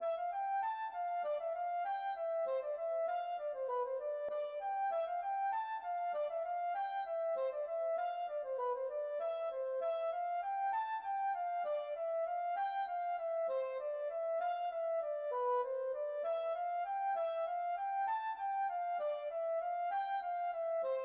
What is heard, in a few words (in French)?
scottish Valse